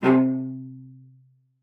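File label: cello spiccato C5, cello; Xsample VOL-5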